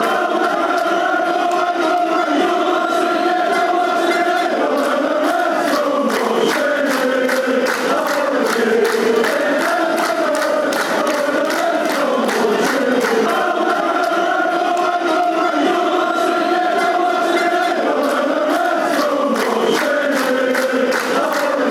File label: reds marching, fanchants